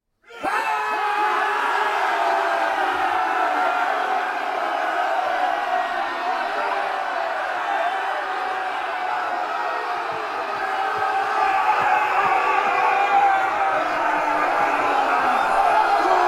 People Screaming In Agony When Charging Into Battle